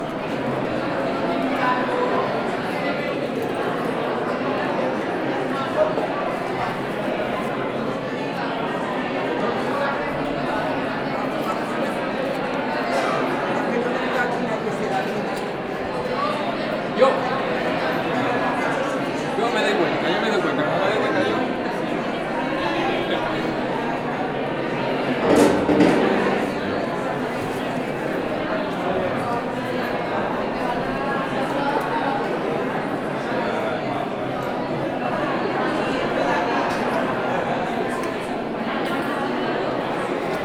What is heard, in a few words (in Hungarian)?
tomeg morpalota